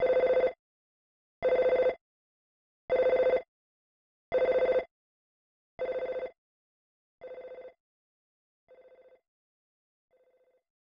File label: phone; Earthbound; snes